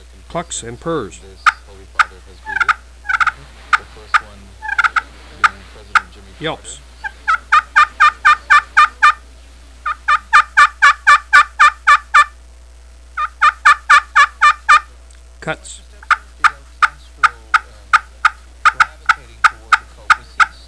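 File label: padauk glass, turkey call; paduak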